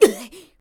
Common Assault Male; Inotia 3

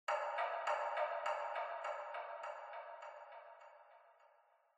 Clock Interior; The Sinking City

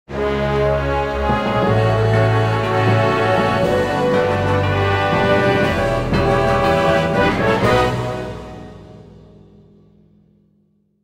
Win Short; heroes of the pacific ps2 windows xbox gamerip 2005

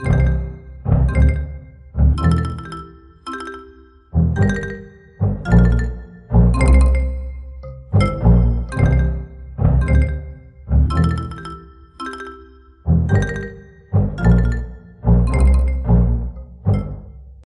Jungle Level

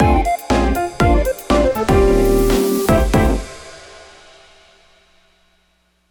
Stage 3 Stinger; Boomerang Fu

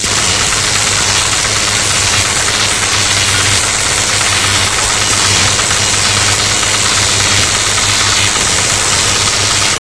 Electro

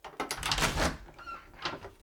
Door wooden

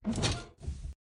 Vent Open; Lethal Company; Windows

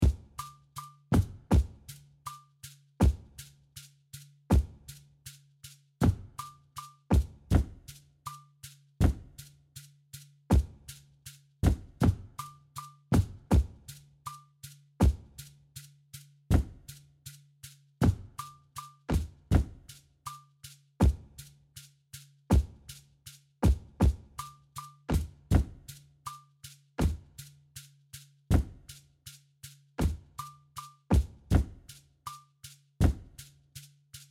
Percusion; laika aged through blood windows gamerip 2023

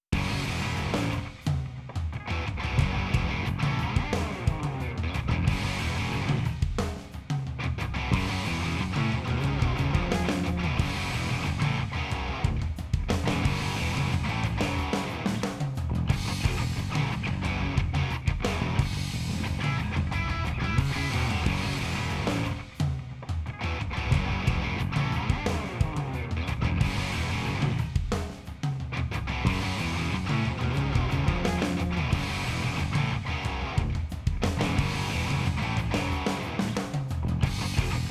BGM Warming Up; Phase2 B

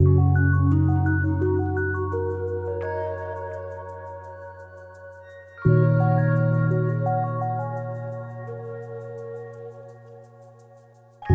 Inspiration Ambience; lego super mario android ios 2020

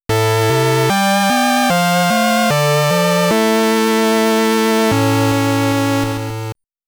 Triumphal Return, Pattern Clear; Contra Chronicle Vol.2 The Beginning of the Legends

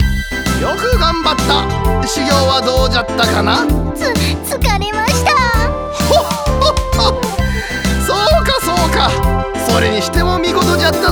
Conversation; tam tam paradise ps2 gamerip 2001

